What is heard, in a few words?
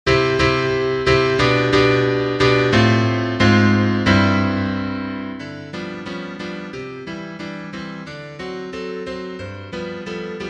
piano, sheet music